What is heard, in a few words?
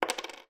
money pound coin down on table; foley